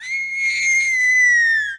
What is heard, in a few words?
Cry